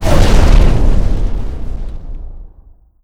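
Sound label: explosion pipe burst decay; Explosions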